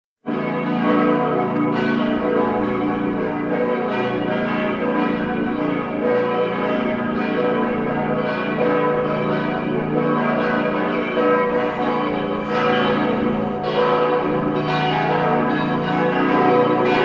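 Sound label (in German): Glockengeläute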